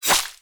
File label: sword cut flesh